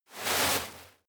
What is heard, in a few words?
step walk graze grass; schritte